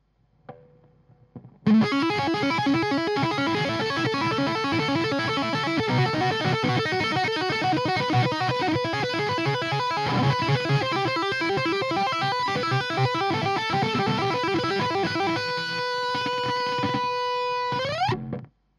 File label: Rhythm Burn SM57; EHX 5150